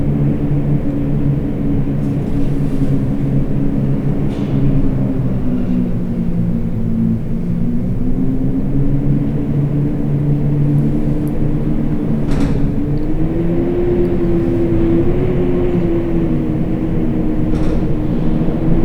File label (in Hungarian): ajto mogul, szel; angyalfold